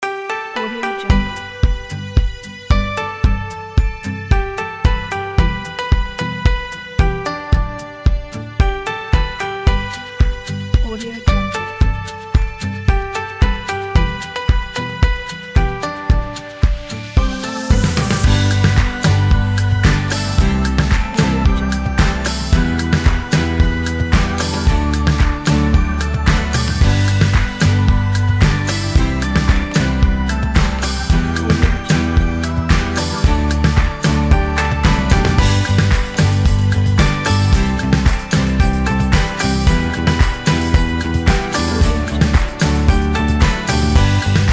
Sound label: summer piano